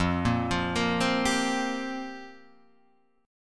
F-Suspended 2nd Suspended 4th down, Fsus2sus4 chord; arpeggiated; JGuitar